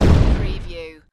Explosion Grenade; Action, Impacts & Explosions

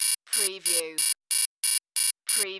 Multimedia Alarm

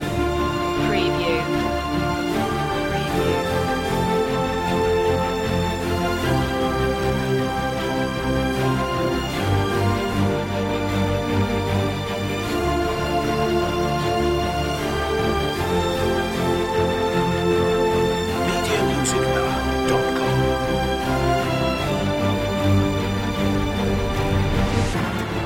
no choir no perc

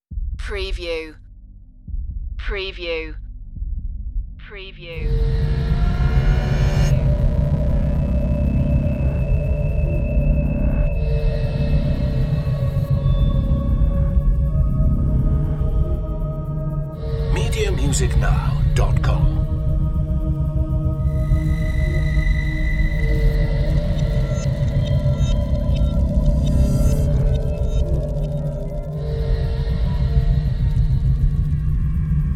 scary soundscape

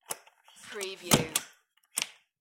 Aluminium Window Close And Lock

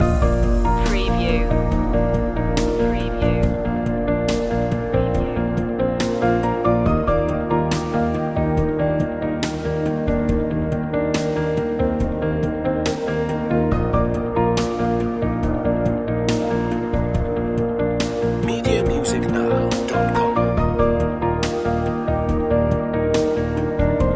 touch the sky loop

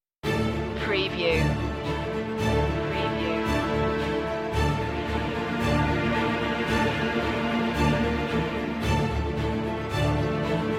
strings mix

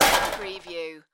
Metal Debris Impact Short Medium

